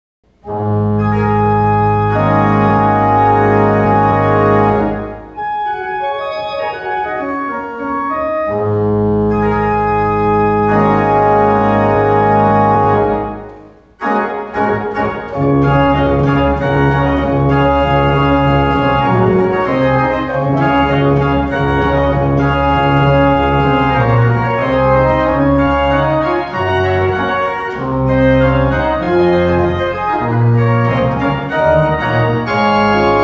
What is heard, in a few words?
Jazz-Pop